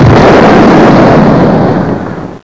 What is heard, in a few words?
Rocket blast; Hardercore Sounds